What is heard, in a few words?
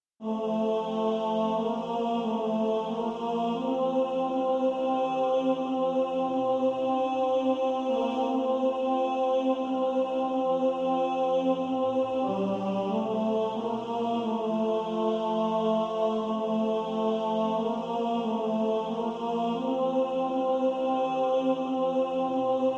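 tenor; Our great savior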